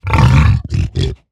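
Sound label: hoglin angry, 疣猪兽：怒吼; Minecraft; 我的世界; 疣猪兽是一种生成在绯红森林的攻击型生物。它们是目前唯一可繁殖的攻击型生物。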